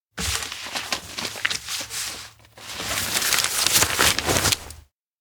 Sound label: docs bundle; anemoi